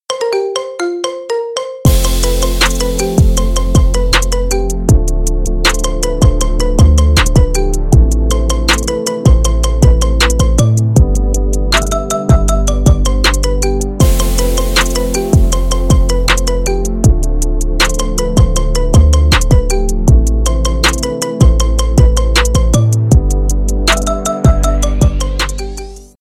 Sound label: marimba remix